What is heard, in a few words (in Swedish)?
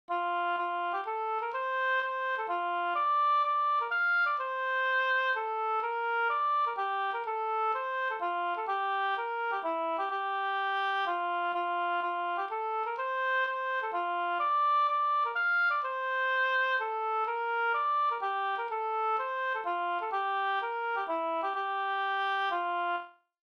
polska